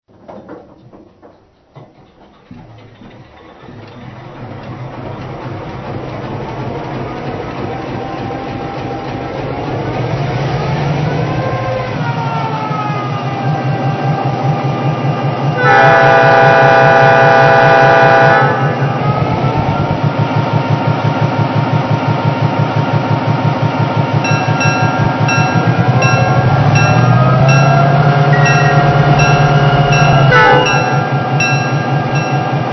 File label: GP-9